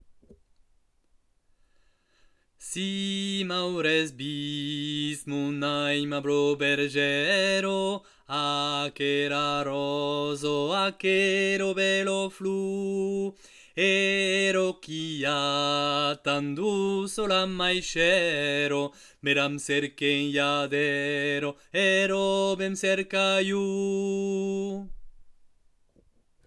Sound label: Si m auretz vist basse